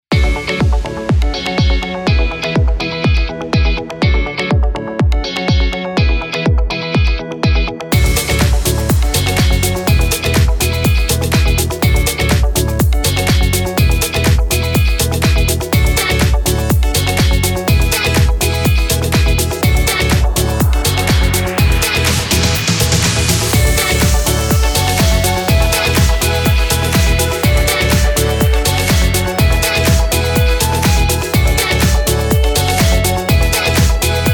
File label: Upbeat